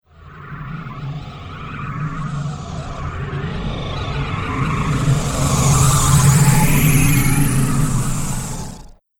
Fantasy Evil Tapestry Spell, magic; Magic Sound Effects; Andrew Fleming

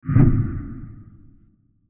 Creepy Transition Swoosh; Scary Soundscapes; Horror; Sound Effects:Horror; CraftedSignals

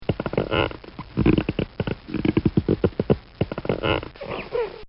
Comical Sound Series Squeaks; Funny Sounds

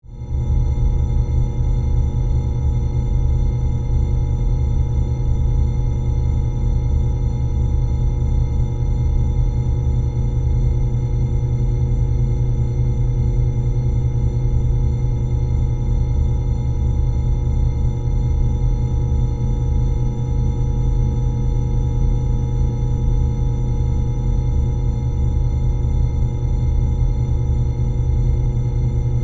Drone Energy Field; Drone Sound Effects; Sound Design; Blast Wave

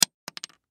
Thumb Tack Drop Wood Desk; Drops; Objects; Blastwave

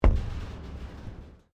METAL IMPACT RATTLE; Materials; Foundation

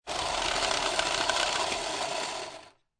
Playing Cards Automatic Cards Shuffler Run

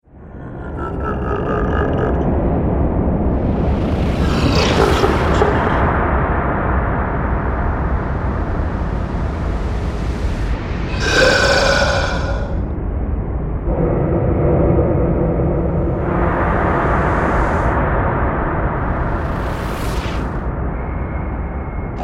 Fantasy Monster Atmospherics; Monster Sound Effects

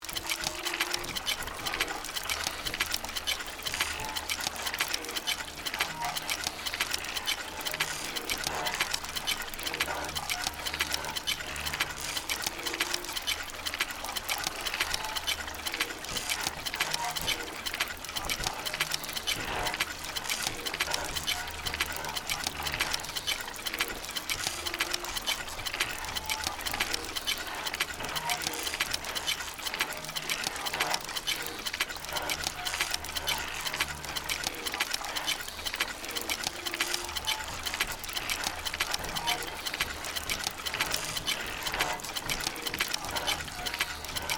Steampunk Lab, Scifi, Steampunk, Laboratory; Science, Fiction; Steampunk Sound Effects; BlastWave

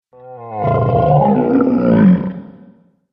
Massive Dragon, monster; Monster Sound Effects; horror sound effects

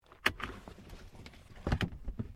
CAR SUBARU LIBERTY INTERIOR SEATBELT UNBUCKLE; Car Subaru Liberty 20 01; Family members Subaru; Cars (Sound Effects:Vehicles)

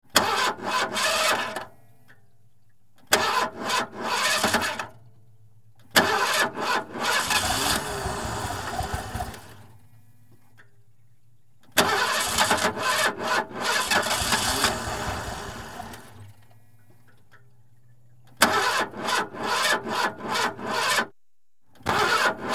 Car Battery Several Attempts; Car , Attempt To Start Several Times , Sputters; Vehicles

